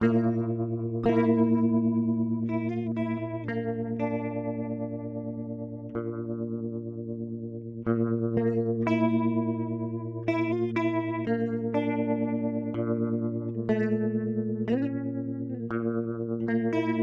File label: Wampler Terraform Stereo Rotary